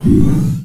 Menu Scifi Select; Menu FX

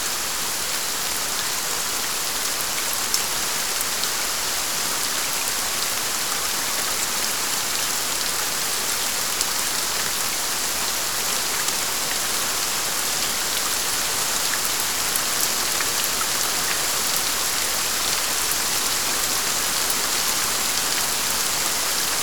Rain strong consistent; boom library; Thunder And Rain

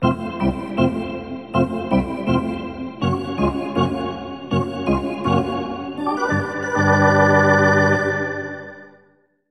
Hockey Organ

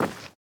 Footstep; AudioWizards

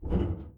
barefoot metal; Apotheorasis

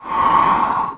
Pickup Magic Speed; Pickups

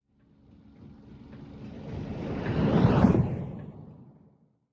sea object; Blind Drive

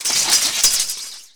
Swish Twin Sword Twirl; Weapons

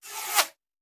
Bullet Pass By; Sound Ideas; Guns

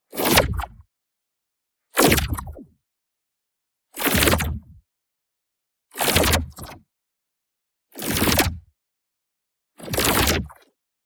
ABILITY RELEASE Ribbed Bending; boom library; Matter

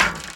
Menu Select

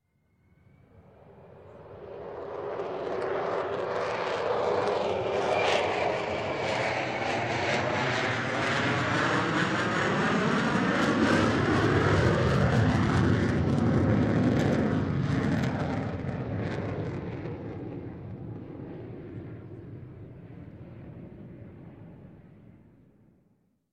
VC10K MK 3 Pass Overhead; Sound Ideas; Series 2000

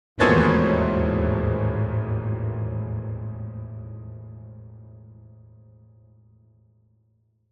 Piano Danger Chord - Higher; Hollywood Edge; sound designer's toolkit v2

